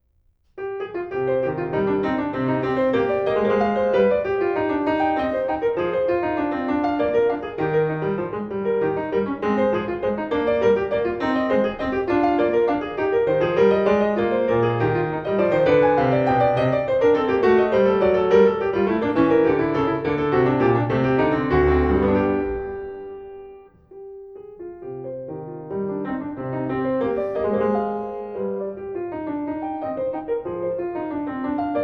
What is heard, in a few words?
Courante; J.S. Bach French Suite in C minor, BWV 813; solo piano